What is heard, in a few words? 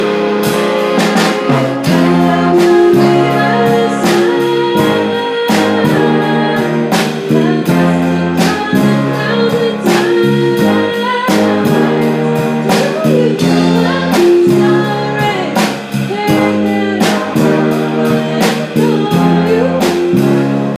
Jazz Band